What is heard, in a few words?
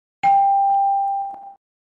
correct answer; fued